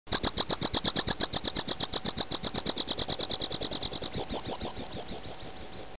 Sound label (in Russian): gornyy konek golos